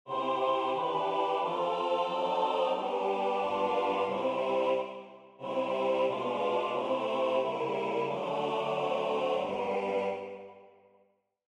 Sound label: Dim7 Secondary; Christopher Dobrian & Omar Costa Hamido